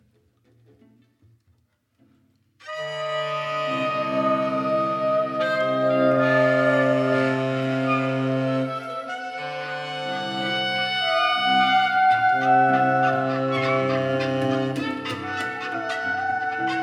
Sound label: Improvisation